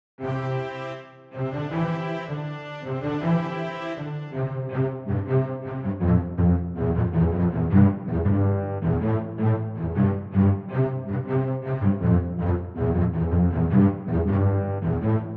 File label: orchestral